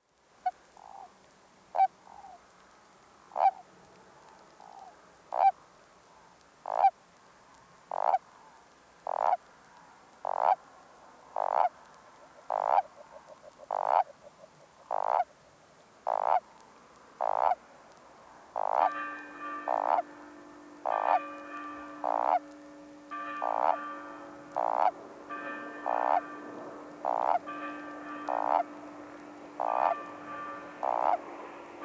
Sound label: spiny toad bufo spinosus; lyagushek i zhab